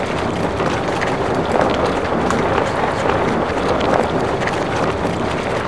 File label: surface dirt; vcmod